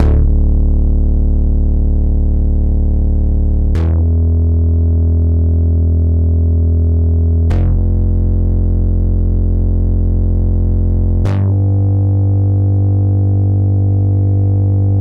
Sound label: Poly Bass